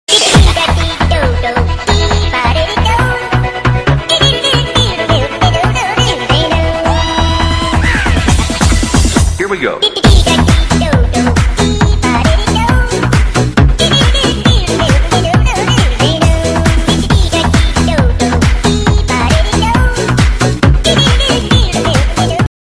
baby remix

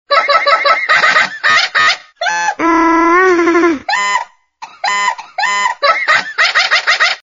crazy pipe ringtone

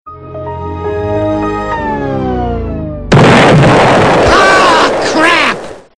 Explosion ringtone; Windows

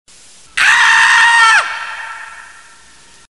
bad scream sound

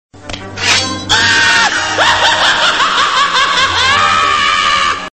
crazy laugh tune

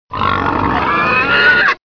pig, som de porco

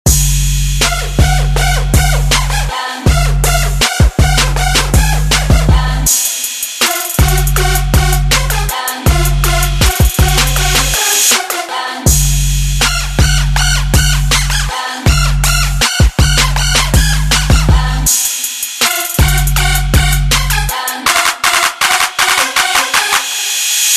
terror music toque

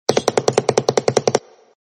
toc toc funny ring

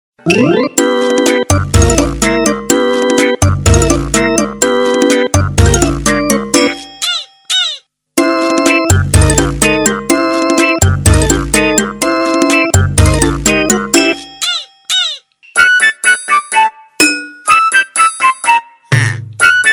tango remix ringtone

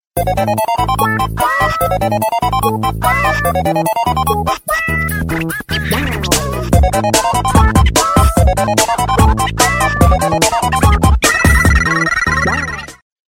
game tone mix

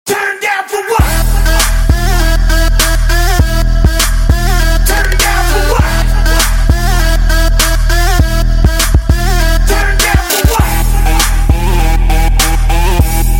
bass ringtone

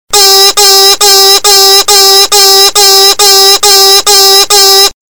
high alert siren ringtone

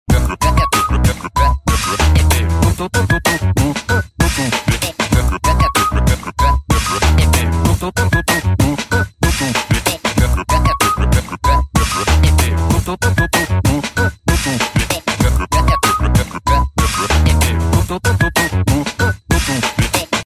crazy hip-hop music ring

